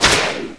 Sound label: banner break; school; Tony Hawk's Project 8; XBOX; inschool